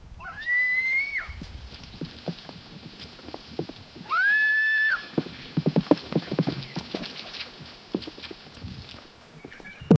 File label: wildlife